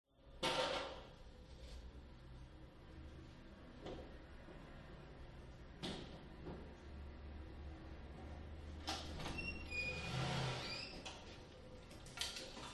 industrial electrician working drilling wall in other room office room reverb 5m; 5m distance; zapsplat